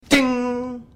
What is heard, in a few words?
Ding; Charlie Sheen